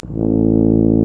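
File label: brass, TUBA C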